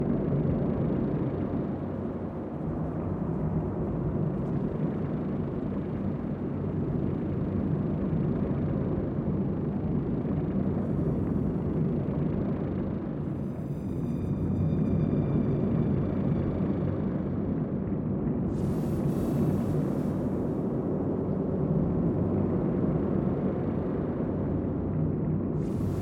Imaginary field recording made on a distant planet buzzing entrance of ca; cave; ShapingWaves; PLANETS